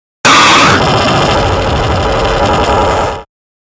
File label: Harsh Blip Static Wash; 5.1 STEREO MIX; Blastwave